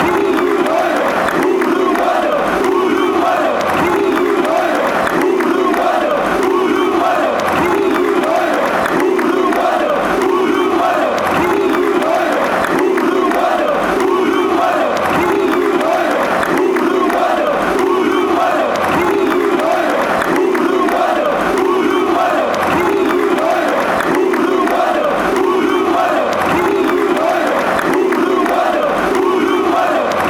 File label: uruguayan fanchants